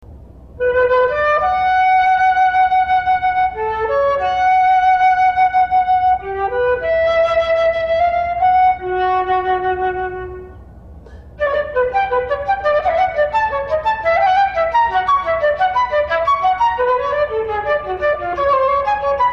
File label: flute; woodwind sound clips